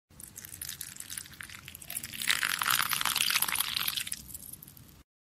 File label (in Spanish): Agua cayendo